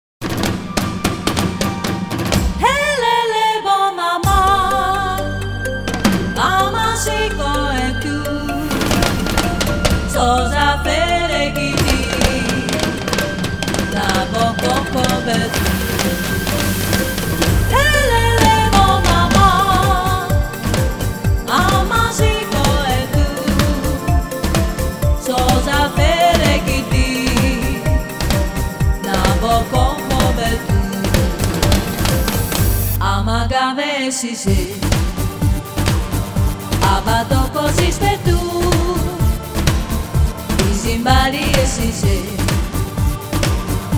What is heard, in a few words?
percus. sol